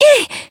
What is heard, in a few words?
attack; hoozuki